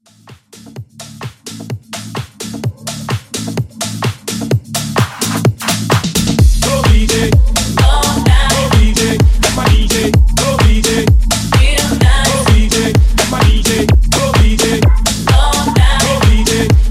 House Hype

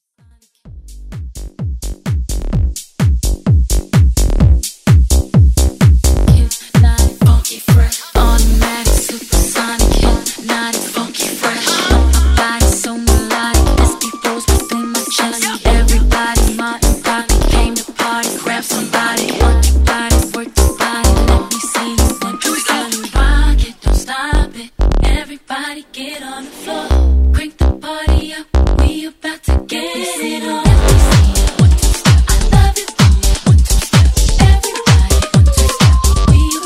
House Vocal

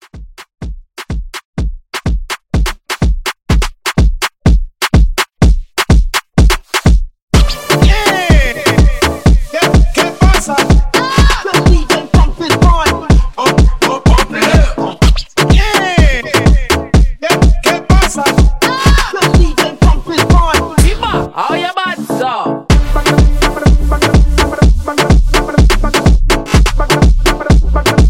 Dance Rework 125

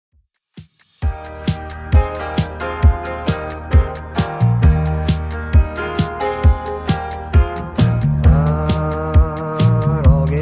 60s Redrum 133bpm

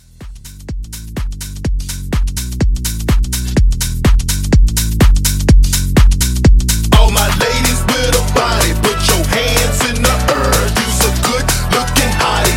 House Rework

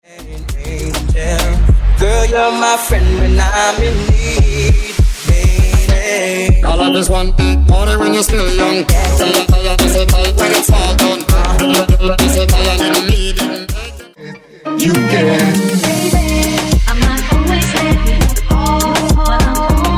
Hip Hop Pop RNB Moombahton Megamix

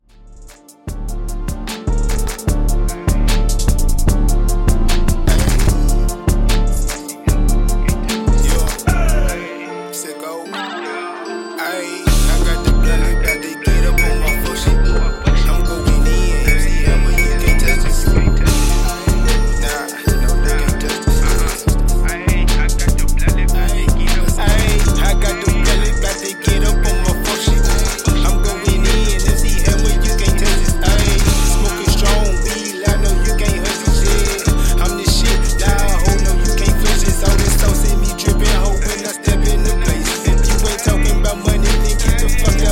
HipHop Trap Funk 150Bpm

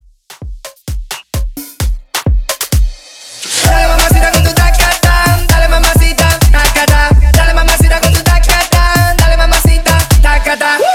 Afro Hype Mashup Intro 130 BPM

